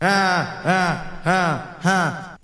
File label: "ah ah ah ah" LOOP; Voice; ooh ahh